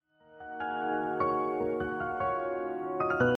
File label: new solo music ringtone